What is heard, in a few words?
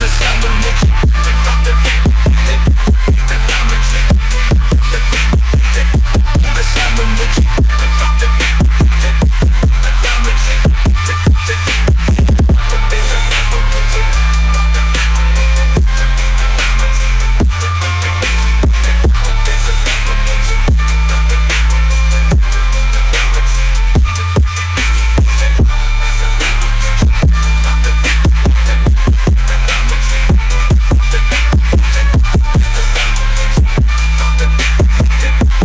phonk hop swing